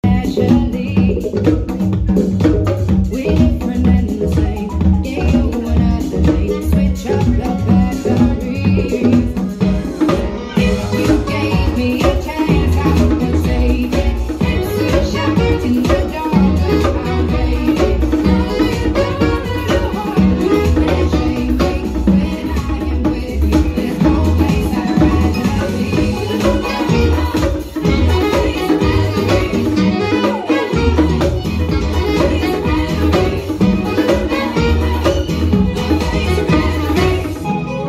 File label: solo percussion